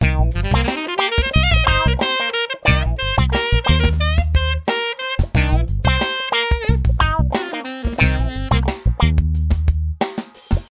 Alto Sax 90bpm Cm Band

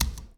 Office Computer keys

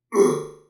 Robotic Male Grunting In Pain

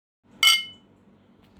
Glass Clink

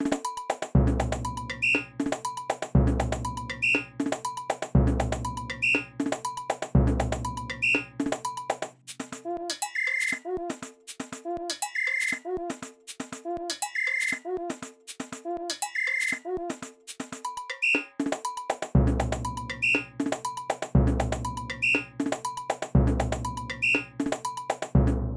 Brazilian Drums

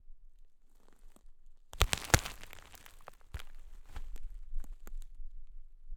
Big Rotten Bough Breaks Close Up Cracking

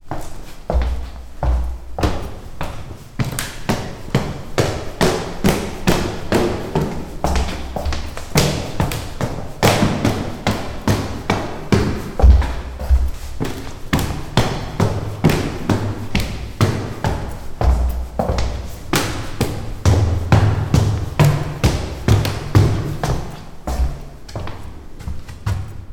Climbing stairs

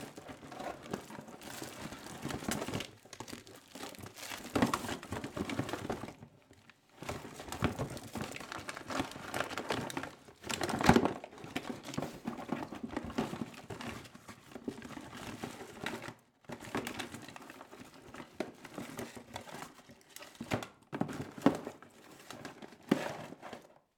Searching Inside A Box

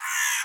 Crow Sound

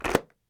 Click Of A Motorcycle Helmet Visor

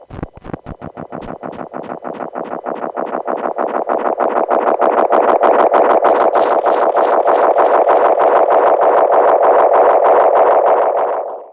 Space Echo

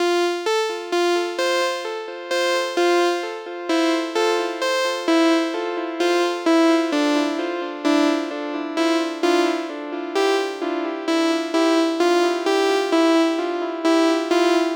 Synth Bouncing Delays

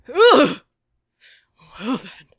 Disgust

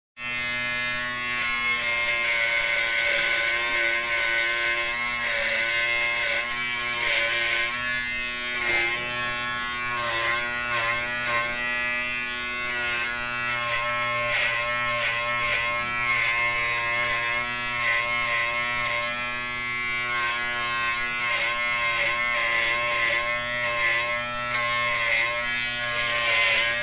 Shaving In The Afternoon

